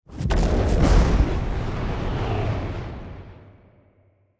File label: ship combustion move; ships; pre-FTL; Stellaris; first contact